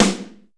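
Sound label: snare drum; East West; Global Sounds; RY30 DRUMS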